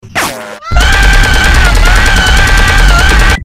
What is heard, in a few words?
terrified scream